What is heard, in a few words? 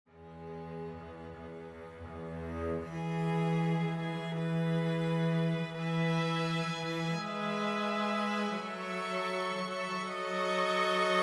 String Quartet; Spiritual